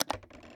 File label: Audio-Log-Player Play